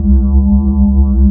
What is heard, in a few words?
Shield; Apotheorasis